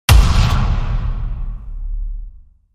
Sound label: Hit